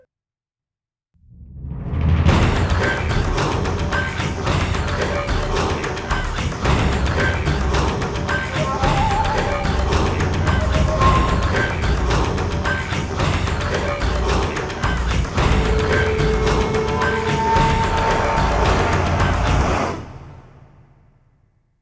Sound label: Monster Hunting Combat; The Witcher 3 Wild Hunt; Skellige